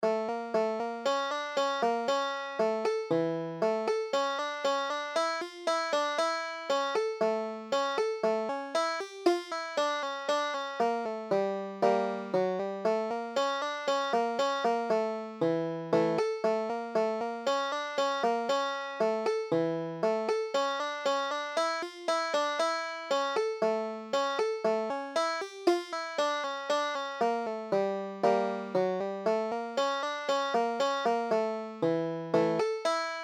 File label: khosidl, banjo